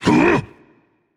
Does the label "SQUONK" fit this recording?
No